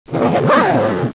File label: ZIPPER